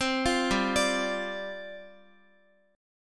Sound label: G 7th Suspended 4th G, Guitar; G7sus4 arpeggiated; x,x,10,10,7,9